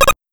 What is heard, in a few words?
megaman land; gamesoundopedia